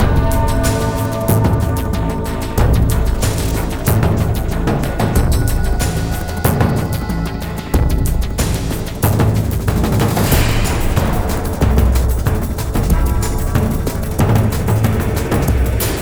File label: music battle; freelancer-hd-edition